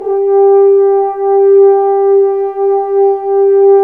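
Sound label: brass french horn mf; horns; Roland - LA Composer vol. 1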